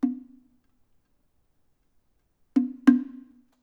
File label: Mokugyo; Instrumentensammlung